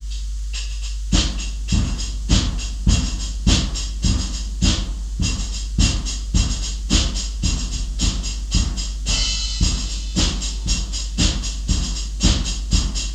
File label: drum